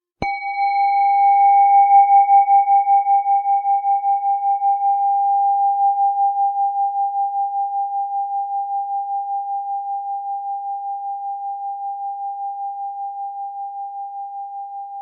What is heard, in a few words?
bowl